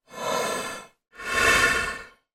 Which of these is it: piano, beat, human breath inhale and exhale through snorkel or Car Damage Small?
human breath inhale and exhale through snorkel